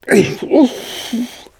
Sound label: launch pain; hl2 ep2; outland 12a; eli